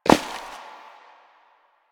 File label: Step Ice; Warframe; Koumei